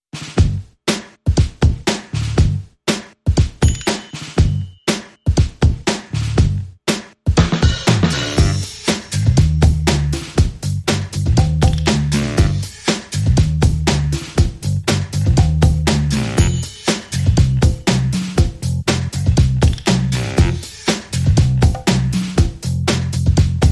Drums and Bass Only

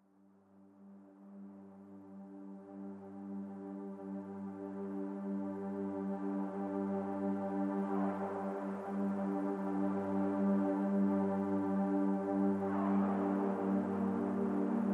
No Lead Synths Mix

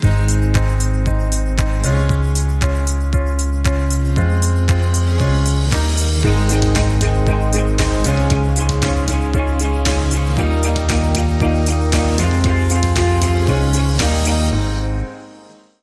Underscore

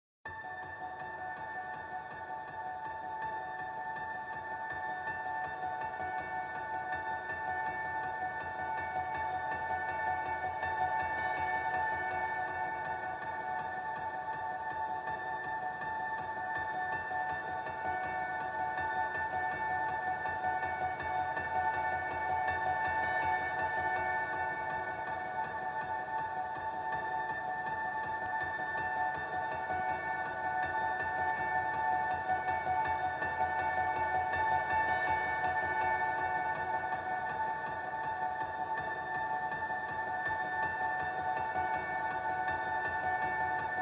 Magical Piano

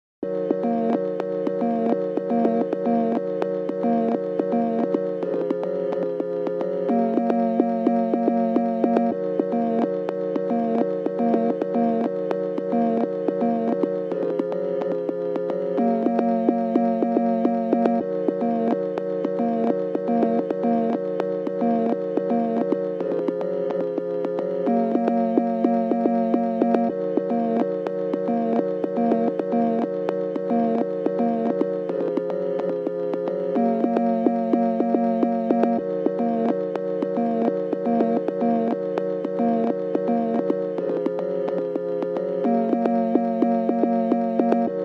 Arpeggio Only

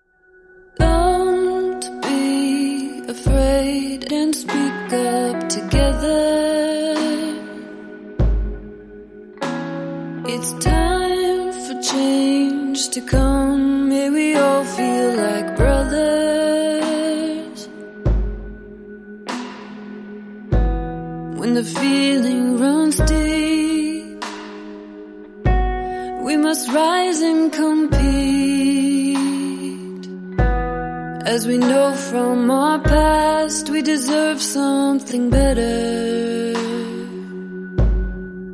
Ambient Mix with Vocals

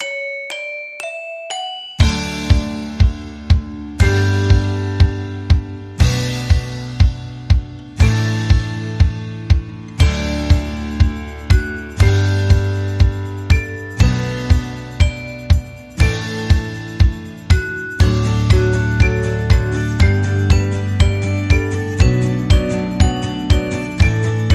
Upbeat

